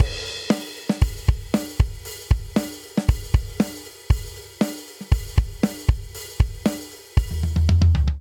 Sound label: Straight Rock Outro 117 bpm, Drum; 4 4; MIDI Clips; Ableton; Factory Packs; Drum Booth